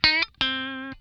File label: LICK SCALE, Funk Guitar; Zero G - Funk Guitar